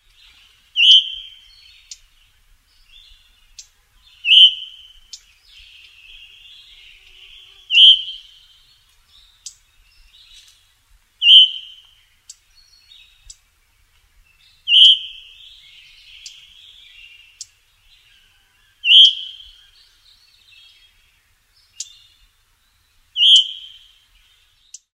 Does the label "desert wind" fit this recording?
No